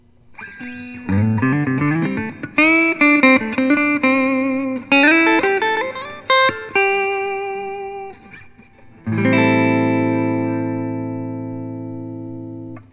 paul reed smith hollowbody ii 594 cherry sunburst, guitar